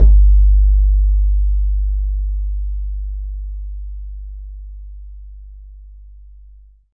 BAYAN; Q Up Arts; Denny Jaeger Private Collection

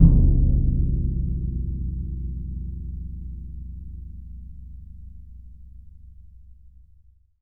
BS DRM PP; ILIO; Synclavier Percussion 2; World & Orchestral

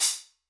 Soft Glitterbomb A, Hats; Airwindows

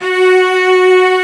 ARCO CELLI; E-MU Formula 4000 Series Vol. 5; Protozoa; Proteus2 Instrmt

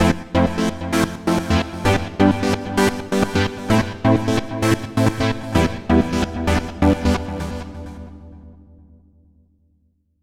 Trance Seq; Dr. Fink's Funk Factory

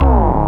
BASS, 170 BPM, Drum & Bass; Ueberschall; Drum & Bass Flash Trackz

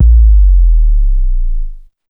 BD, Big Beat Drumloops

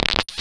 Frozen Bubbles; Cwejman S1; DevSnd Cwejman S1 - JK

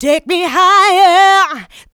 mixed vocals; Houseworx

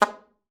alto sax G#3; Xsample VOL-2

